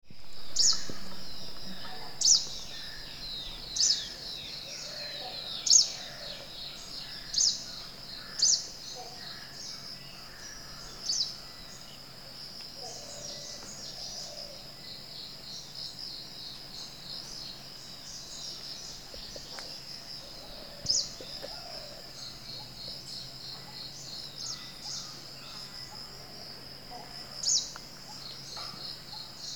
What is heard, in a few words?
Greenish Warbler, Thekkady